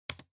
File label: PC-Keyboard (Space-Hard)